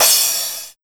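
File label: TECH 140 crash, Big Beat Drumloops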